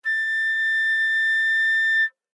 Flute nonvib ff A6 stereo; Woodwinds

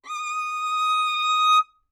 Violin arco ff sul A Eb6; MIS Pitches - 2014; Strings

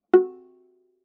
Viola pizz ff sul G Gb4; MIS Pitches - 2014; Strings

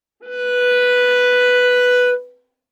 Viola arco ff sul G B4; MIS Pitches - 2014; Strings

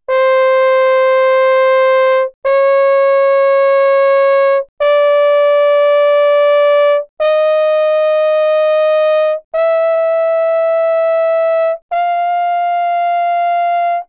Horn, ff, C5 F5, french horn; Brass

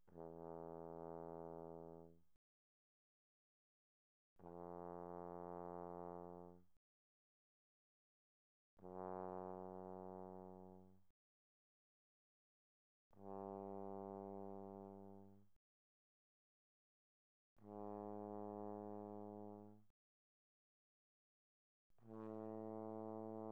Tenor Trombone, pp, E2 B2; Brass

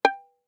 Violin pizz ff sul D G5; MIS Pitches - 2014; Strings

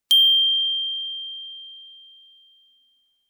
bells brass ff G7; Percussion

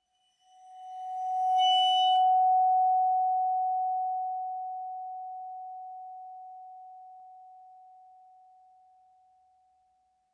Vibraphone bow Gb5 stereo; MIS Pitches - 2014; Percussion

